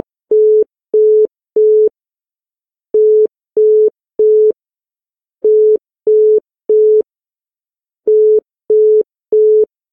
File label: Dialtone In